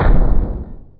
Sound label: collide block; kids-tetris